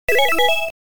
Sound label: Obtaining a Treasure Box (SFX)